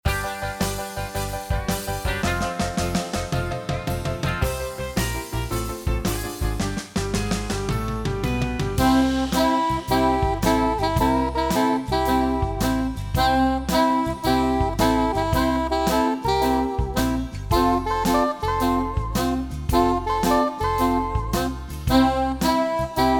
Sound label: Blues Tube